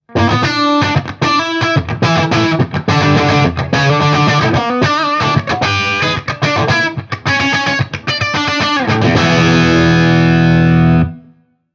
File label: Rock, ringtones; Marshall; London KB 1501